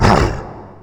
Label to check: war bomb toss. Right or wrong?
Right